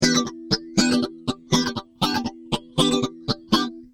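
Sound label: rhythm guitar Mutron biphase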